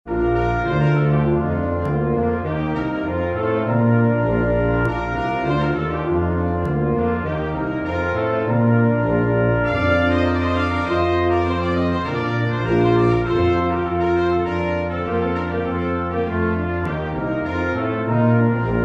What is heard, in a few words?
dingdong quintet; Ding Dong Merrily on High